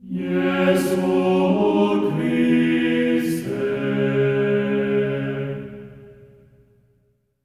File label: JESU C#4, Symphony of Voices; Spectrasonics